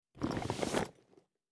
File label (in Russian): zvuki bagazhnoi karuseli v aeroportu